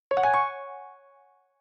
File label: Sys App Positive; KDE